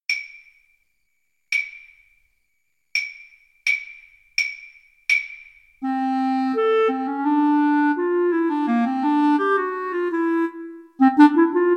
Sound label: Flute Clarinet Duet; euphonic sounds